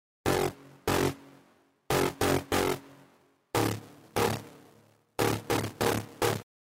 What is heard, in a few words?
bs high A; Sublab XL; Digital Basses; Dancy DigMe Bass; Reverbをインサートし使用